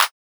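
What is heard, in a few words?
Clap, 90bpm, F minor; Unbound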